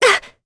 Vox Landing; Talisha; kingsraid

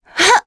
Vox Jump; Shamilla; kingsraid

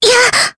Vox Attack, jp; voices; heroes; Jane; kingsraid

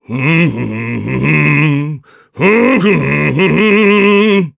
Hum, jp; Ricardo; kingsraid; voices heroes

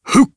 Vox Jump, jp; voices heroes jp; Dakaris; kingsraid

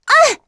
Vox Damage; kingsraid; en

